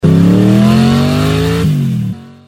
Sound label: Motorcycle, notifications; NEC Casio MEDIAS-PP-N-01D